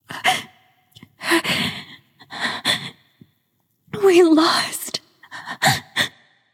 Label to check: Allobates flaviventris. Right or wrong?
Wrong